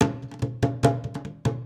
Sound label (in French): Tamtam Monture Loop; rakoo friends wii u 2015